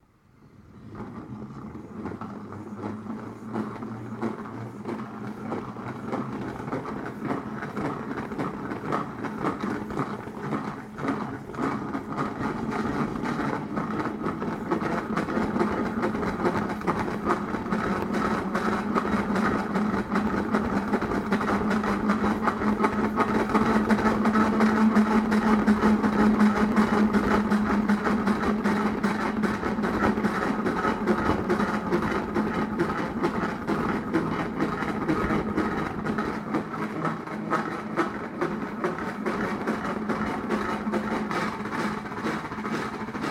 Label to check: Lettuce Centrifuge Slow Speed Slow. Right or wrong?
Right